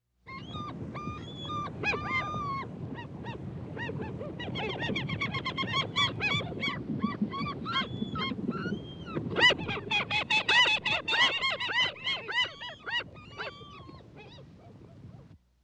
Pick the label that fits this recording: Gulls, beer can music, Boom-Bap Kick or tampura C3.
Gulls